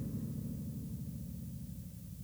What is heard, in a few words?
Misc Noise